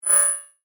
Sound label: Multimedia, Element, Error, Beep, Accent, Transition; Production Element, Imaging Element; Button; BlastWave